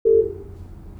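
0deg, 400 Hz tones with 50 Hz sinusoidal amplitude modulations; Binaural recordings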